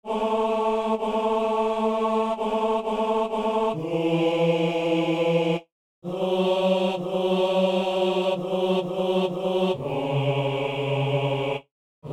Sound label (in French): basse; Nés de l'eau et de l'Esprit